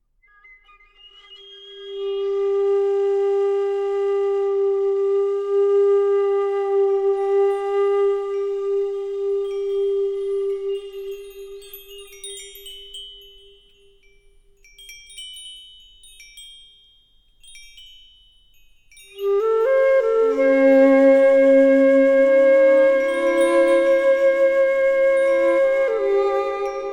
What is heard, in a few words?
Tibetan Meditation Music